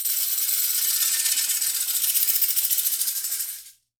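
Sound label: METALLIC SH, JINGLE STICK; Voice Crystal - Steve Reid Definitive Percussion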